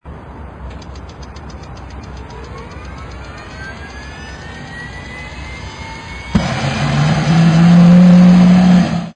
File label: helicopter